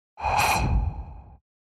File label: Whoosh